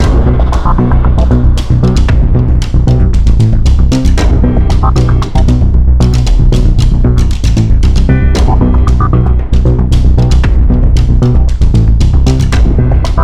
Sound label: Tense